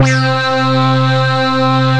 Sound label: Synthesizer Middle C Notes, Lead